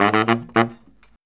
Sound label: BARYT, Killer Brass Riffs